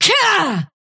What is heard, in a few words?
effort melee big